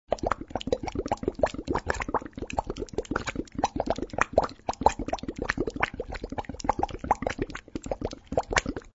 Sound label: Boiling